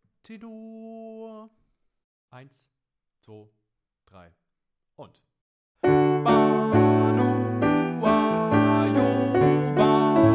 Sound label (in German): Tenor mit Tutti Klavier; Banuwa